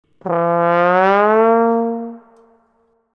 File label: glissando trombone